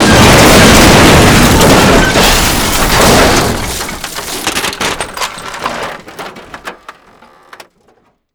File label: car crush bog; Modern Warfare